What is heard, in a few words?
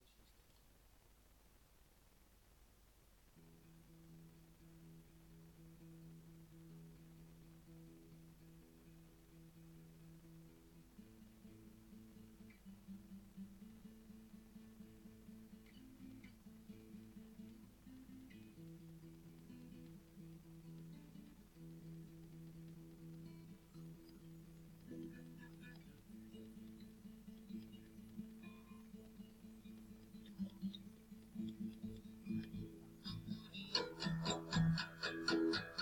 Fulvios Guitar